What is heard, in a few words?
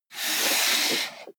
computer mouse draw long